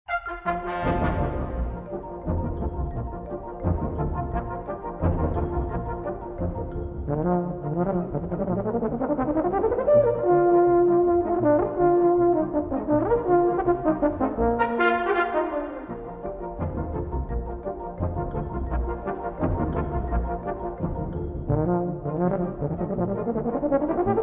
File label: Banjo